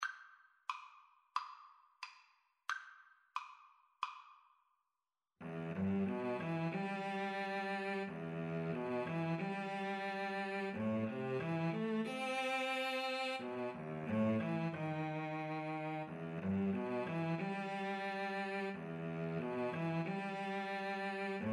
Cello Duet

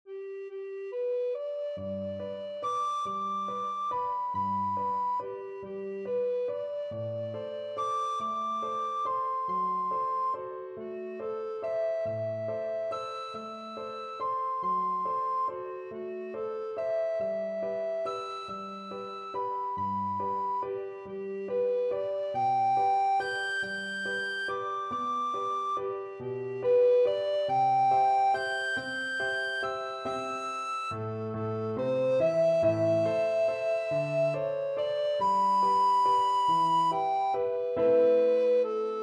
blue danube, alto recorder

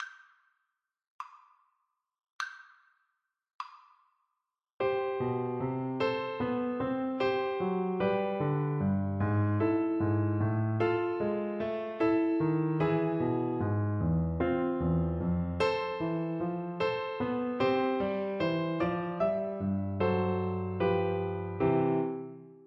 la paila FL kar, Flute

